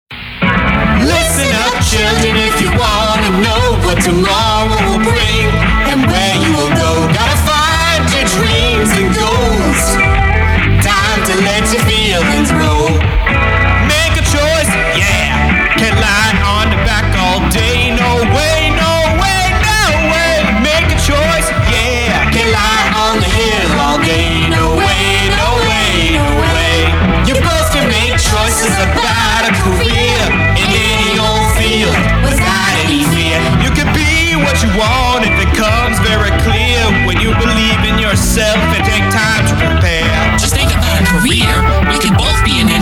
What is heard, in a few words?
cover with backing vocals